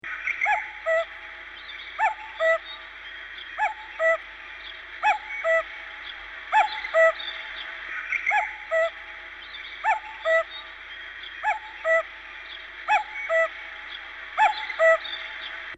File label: bird